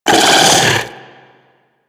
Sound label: Arachnoid Wraith Vocal Attack Short